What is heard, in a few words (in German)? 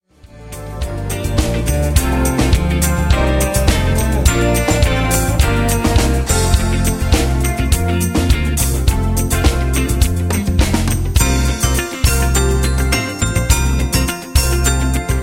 Friede auf Erden playback